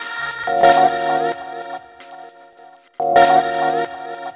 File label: LOOP, Akai MPC-5000